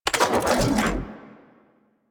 Arch Gun Primary Beam End, Weapons; Warframe; Archwing; Hildryn

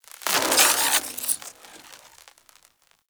Voltaic Weapon Electricity Whoosh; Weapons; Enhancements; Elemental

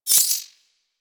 Glass Shotgun Shot Prime Layer A; Warframe; Tenno; Weapons

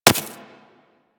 Laser Impact Generic; Surface

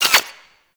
Necramech Repair Drone Idle Short; Warframe

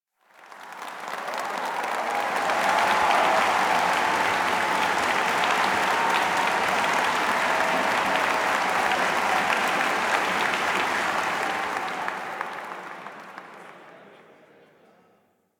Amphitheatre Crowd Applause Long; Ambience; Duviri; Warframe

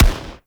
Arch Long Rifle Shot, Weapons; Warframe; Archwing

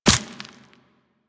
Walk Bone, Footsteps; Warframe; Operator

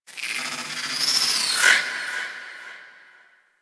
Sentient Lair Exterior Creature; Misc Creatures; Warframe; Ambience

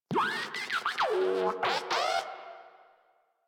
Moa Compassionate Vocal Greeting, Pets; Warframe

